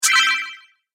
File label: Coin Magic, magic sound effects